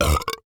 Human Male Burp

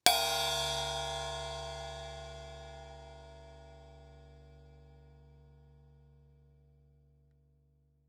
Cartoon Drum Cymbal Hit; AdobeCC

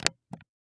Video Player Buttons; Video Now; AdobeCC

